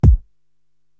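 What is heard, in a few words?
bass drum Deep House, Korg TR-Rack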